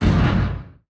distance torpedo fire; weapons; Stellaris

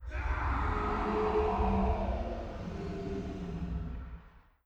distance dimensional horror attack roar; Stellaris; guardians

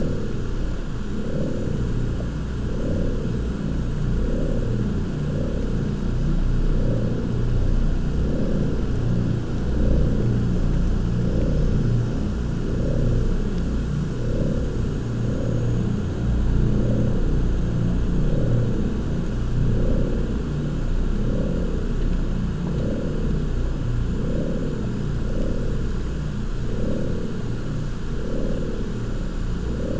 ship transport ship idle; ships; Stellaris; toxoids

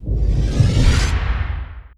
space cloud death; Stellaris; other entities

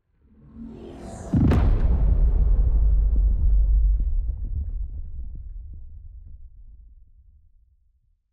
ship colossus move; Stellaris; shroud; ships

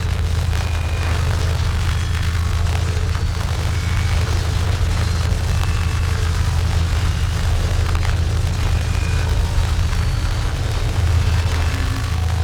system reality breach energy; Stellaris; nemesis

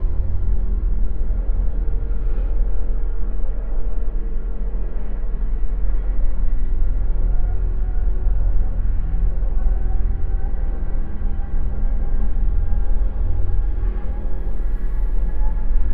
star eater idle hum; Stellaris; nemesis

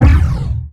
laser fire, weapons; Stellaris

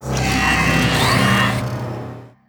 event ai started; Stellaris

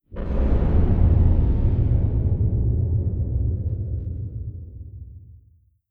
distance extra dimensional ship death; ambient; Stellaris

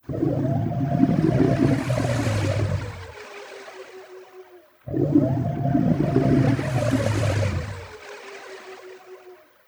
space amoeba idle; Stellaris; other entities

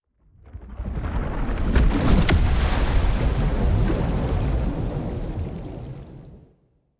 colossus planet hit; aquatics

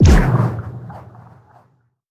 scavenger bot missile; Stellaris; distant stars

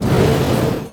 spaceport build; Stellaris

